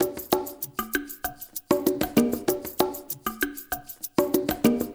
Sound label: PERC MX; Spectrasonics; Liquid Grooves 1 & 2